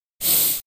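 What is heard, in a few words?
nose blowing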